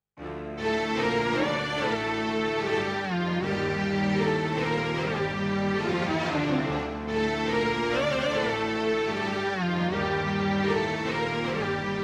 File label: Kamangat; Pa2X